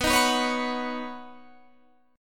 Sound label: B Major Add 9th B Guitar Drop D